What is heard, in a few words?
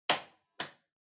Washer Front Load Noise Lock